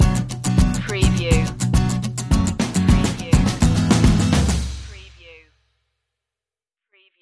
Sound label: adventure plus stinger